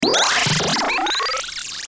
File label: Stinger Delay Digital Warble; Production Element Imaging Element Accent Transition